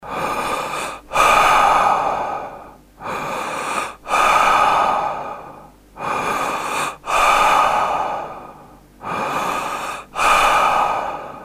tieng hit tho cham va sau cua nguoi dan ong

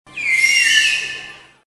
tieng ca heo keu trong be ca heo

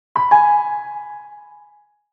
am thanh tieng nhan chuong cua

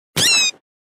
am thanh cua mot con doi sap chet

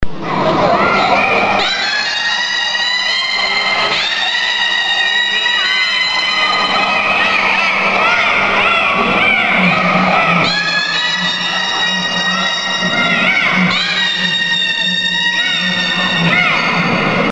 ca heo trong be ca heo

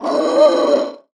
tieng con lon dang tuc gian

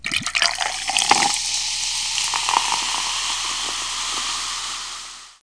rót lon bia sủi bọt

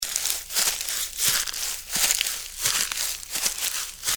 am thanh tieng buoc chan di tren la roi

